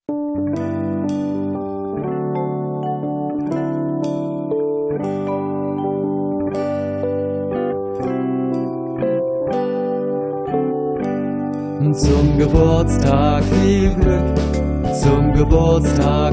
Happy Birthday German Version; Happy Birthday Song in German Version

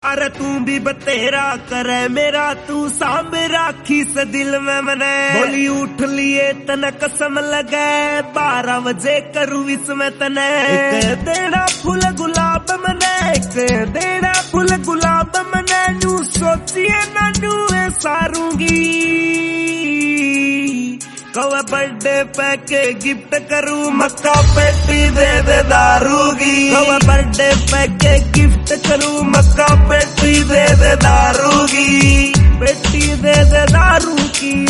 New Birthday Haryanvi Song

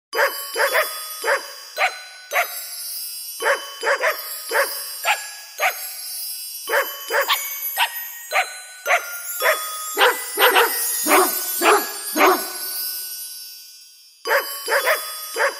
Dog Barking Happy Birthday Song, Dog Singing

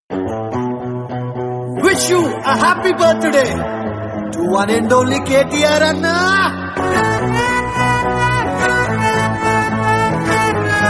Birthday Song Birthday Song Telugu

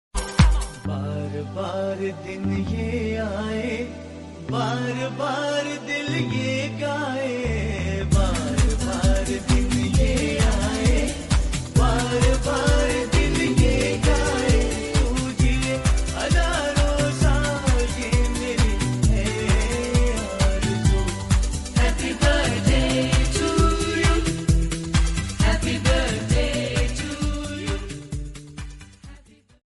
Happy Birthday Song Male Version Hindi

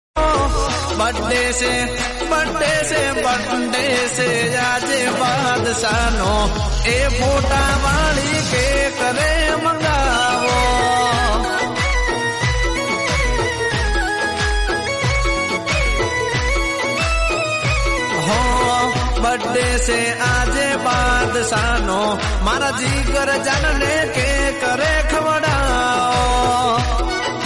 Gujrati Birthday Song

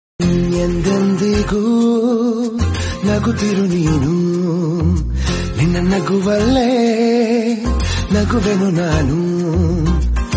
Happy Birthday Kannada Music For Female

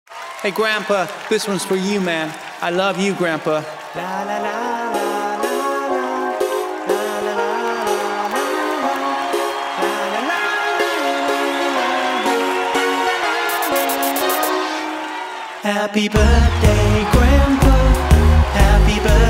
Happy Birthday GRANDPA; happy birthday song for Grandparents

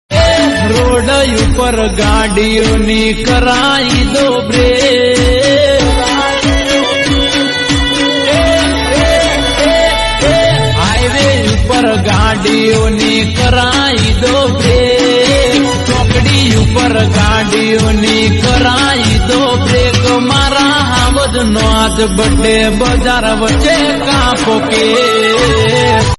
Happy Birthday Gujrati Birthday Song

